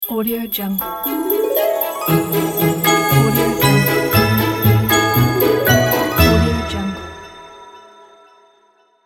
christmas logo

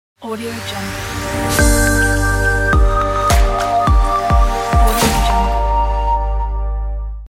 logo ident

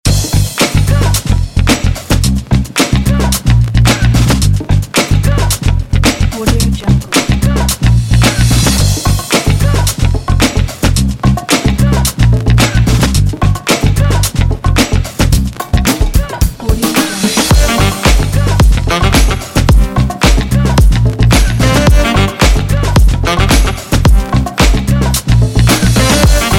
happy funk

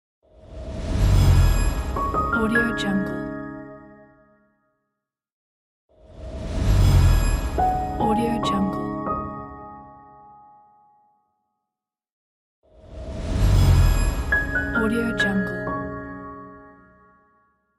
short logo

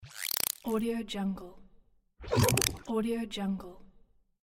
cartoon spider

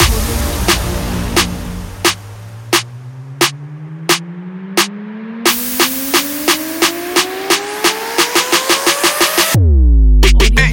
rap beat

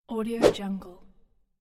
quick swoosh

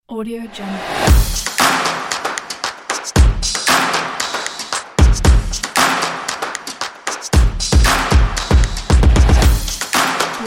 soccer action drums

